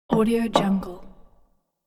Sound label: palace plates moved on table